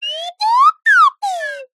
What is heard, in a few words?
cartoon slide whistle up and down